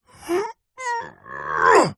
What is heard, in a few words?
human male voice grunt groan lift heavy weight